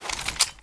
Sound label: mg42 deploy bipod; weapons